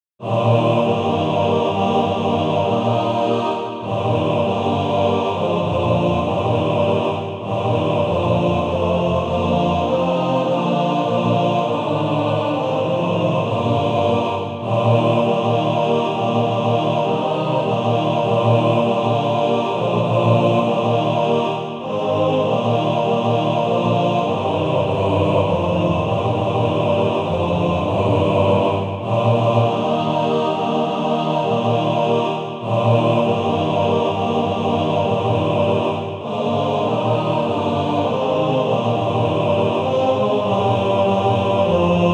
Male Choir; A Child of A King; TTBB

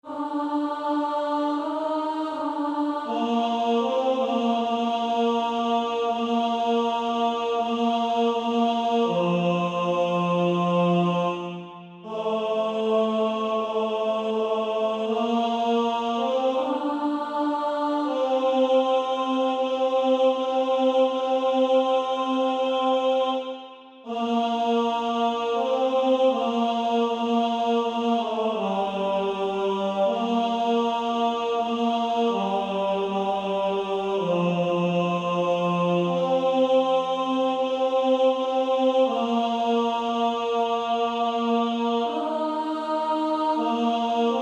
Female Choir A2

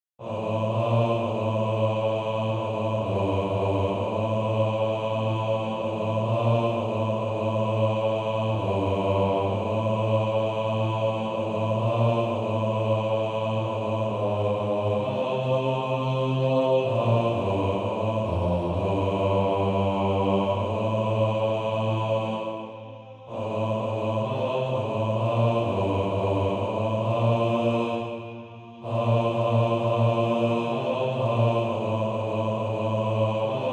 Male Choir

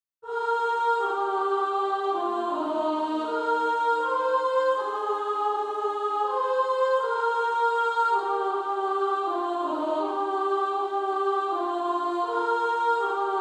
Female Choir 2S; He Leadeth Me

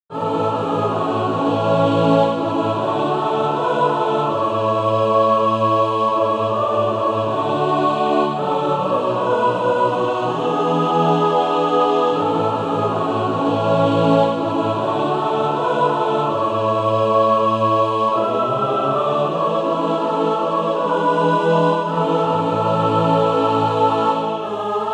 mixed; SATB

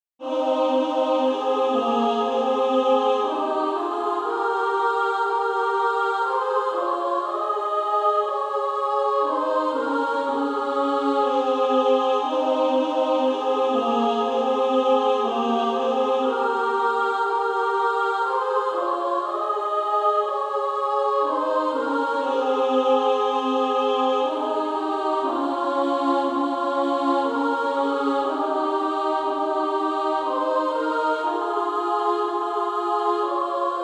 Female Choir SA; Infant Holy

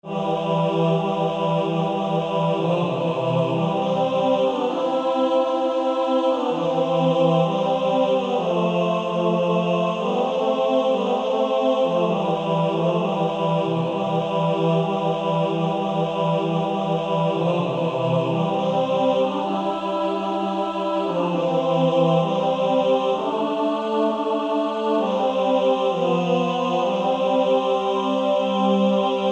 Male Choir TB; Battle Hymn

